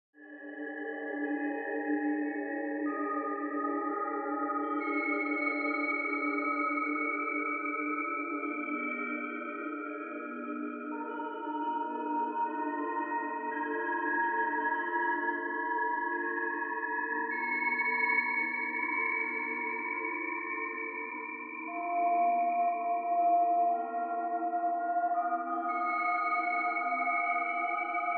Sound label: Ambience Time Tunne; drones